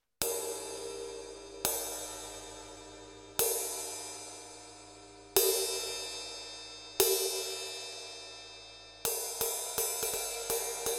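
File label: Ride Paiste 3000 18, cymbales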